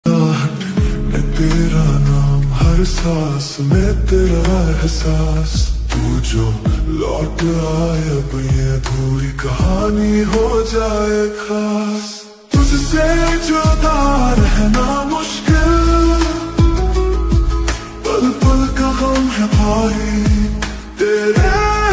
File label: lofi ringtone, hindi ringtone, ringtone song, sad ringtone